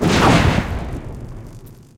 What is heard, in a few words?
scatter Lightning Strike; parthenon